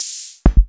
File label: Hohner Automatic